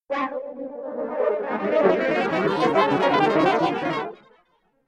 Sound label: blur phrase; philippos